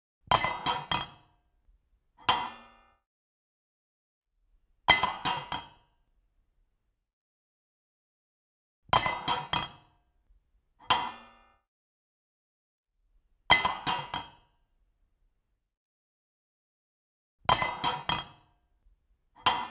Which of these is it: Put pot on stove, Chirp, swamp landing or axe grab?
Put pot on stove